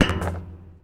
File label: Street Ball Metal Basket; SFX Kit